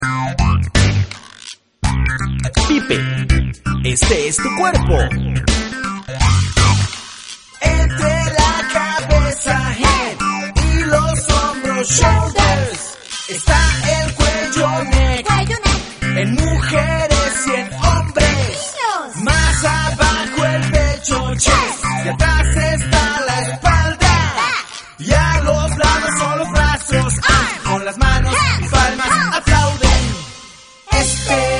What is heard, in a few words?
pipe